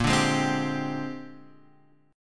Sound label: Bb 13th Bb down Guitar Drop D; Bb13 strummed; Bb13 chord